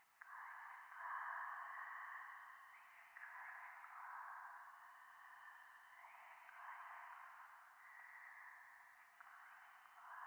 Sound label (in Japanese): ゴースト しこしこ 山奥